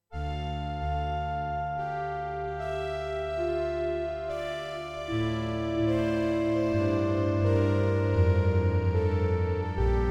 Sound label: Z1 Samples pad silk